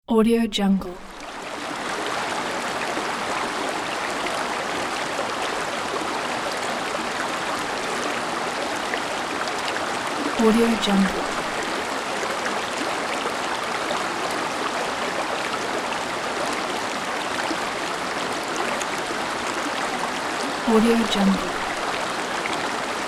calm flowing river

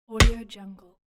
kicking childrens rubber kick ball

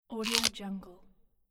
bite

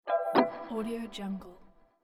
notification lux style

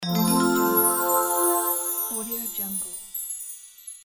happy final

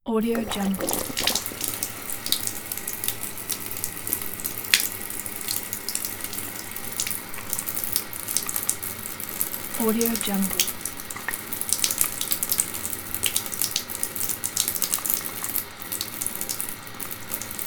water drop down

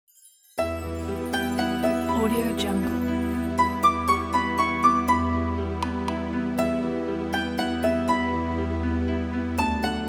medieval fantasy

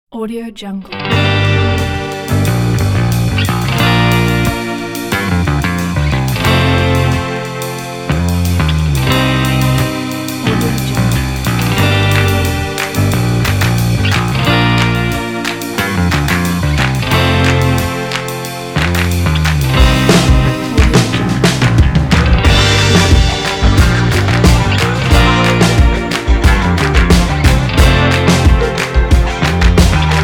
upbeat lite rock

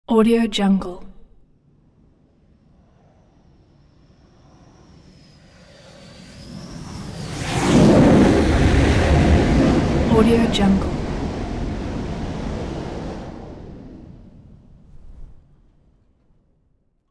super hornet rhino jet arrested landing; sound ideas

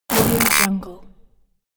cybernetic device control engaged

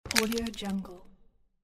condom open

